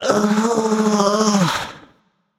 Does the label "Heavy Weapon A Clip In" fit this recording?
No